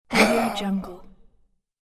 low male kill moan; ناله مرد بعد از چاقو

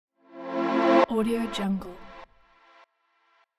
transition chord long delayed; transitions and movement; ترنزیشن طولانی اکو

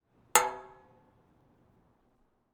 rock hit metal; industrial sounds

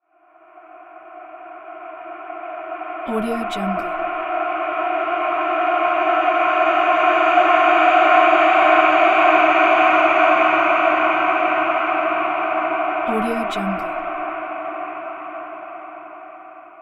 scream; human sounds

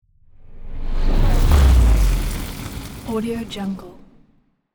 fire large fireball afterburner

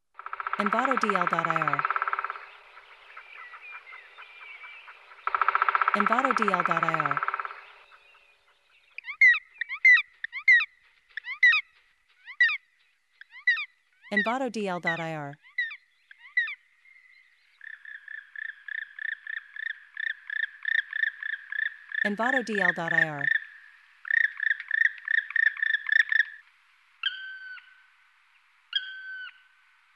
Black Woodpecker Pecks Chirps; دارکوب سیاه; افکت صدای دارکوب سیاه; nature sounds

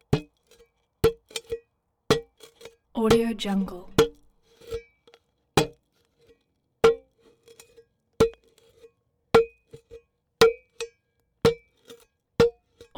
wood hits bamboo chopping series x12 from edge; خرد کردن چوب بامبو

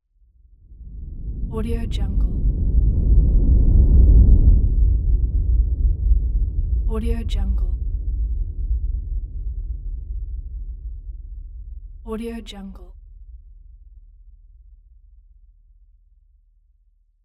rumbling whoosh; transitions and movement

